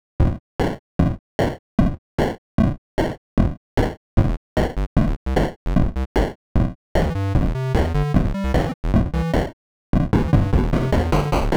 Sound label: possible video game song